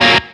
F#-A# staccato; osu

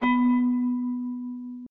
bell b3

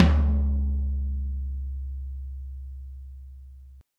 Floor Tom

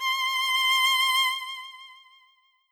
violin

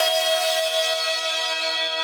synth main

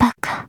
combo break

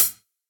soft hit normal

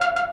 synth; osu

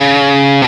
Left-hand vibrato; osu

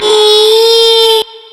normal hit whistle; osu

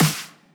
Heavy Snare

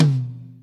Tom Hit; osu

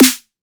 snare

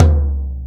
Tom BD

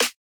fill kit

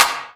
Clap; osu

